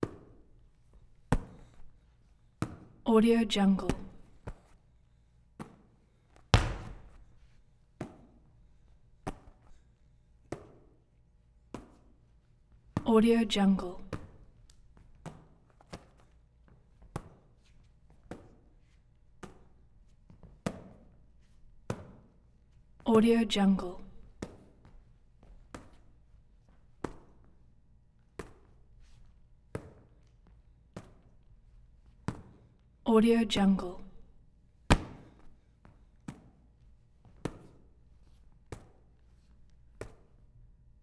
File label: sports volleyball volleyball set; human sounds